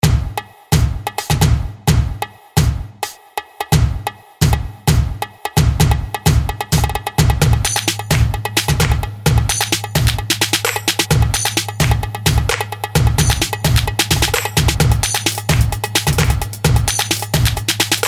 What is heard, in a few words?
Hip Hop Tabla